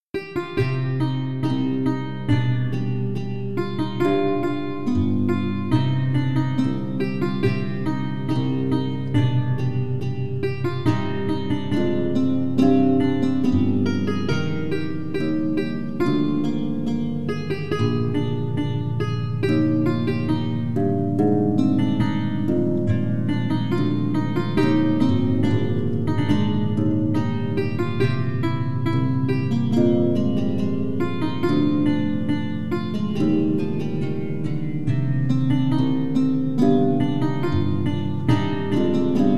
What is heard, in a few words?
bourree Dm